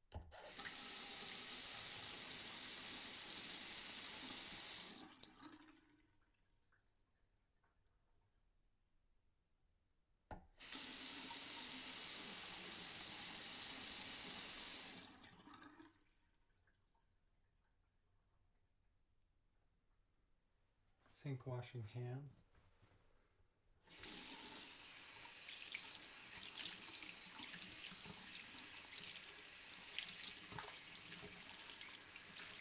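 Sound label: house bathroom sink on off close, interior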